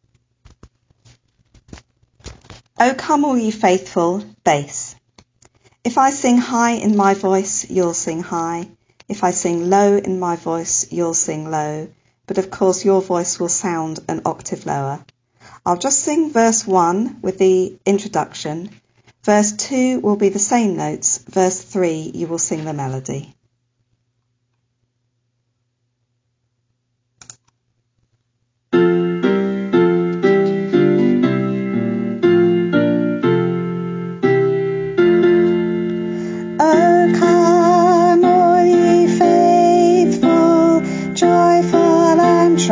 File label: O Come All Ye Faithful – Bass